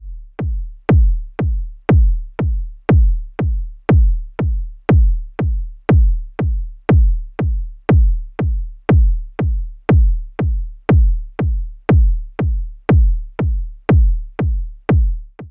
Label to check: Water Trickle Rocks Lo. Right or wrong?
Wrong